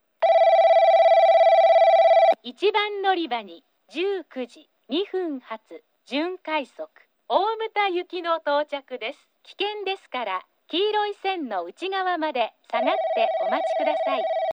kurosaki semirapid omuta; 1番のりば接近放送（準快速 大牟田行き）

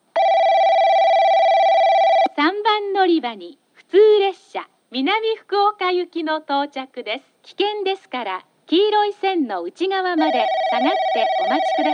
orio local minamifukuoka; 3番のりば接近放送（普通 南福岡行き）; 折尾

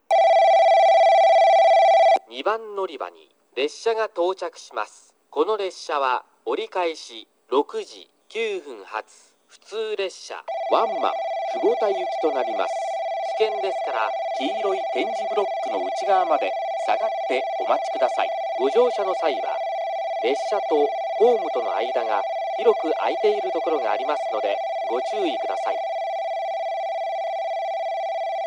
balloon

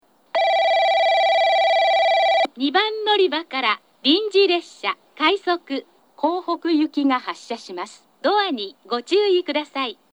balloon; 二日市駅 発車放送（快速 江北行き）

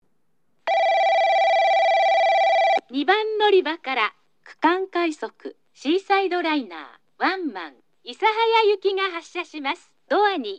regional rapid Isahaya

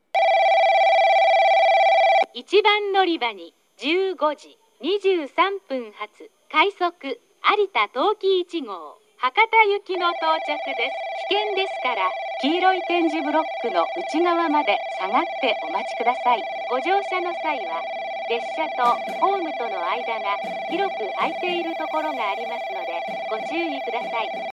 tosu rapid arita tokiichi hakata; 鳥栖駅 接近放送（快速 博多行き）

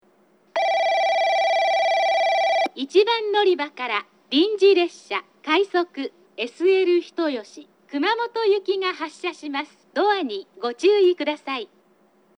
omuta SL hitoyoshi kumamoto

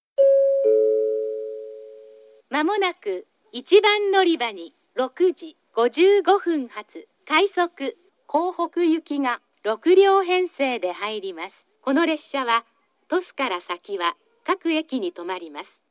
balloon; 南福岡駅 予告放送（快速 江北行き）

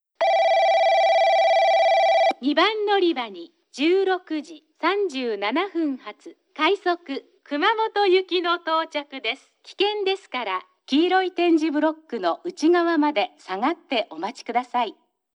omuta SL hitoyoshi kumamoto

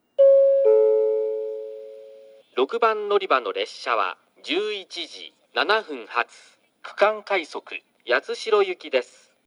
tosu regional rapid yatsushiro; 6番のりば停車中案内放送（区間快速 八代行き）; 鳥栖

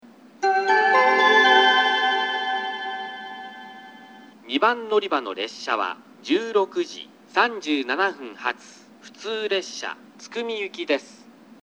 oita local tsukumi; 停車中放送（津久見行き）

